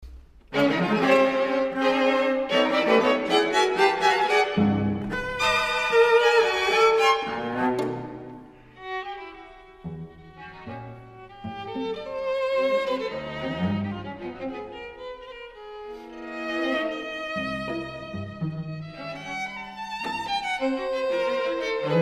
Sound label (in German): steichquartett